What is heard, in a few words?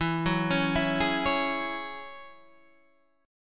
Ab 7th Suspended 4th Sharp 5th, E